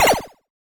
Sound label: Laser Shot 8 Bit